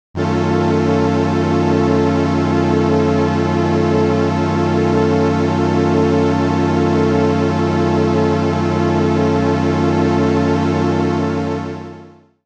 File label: light detuning; elka; gforce vsm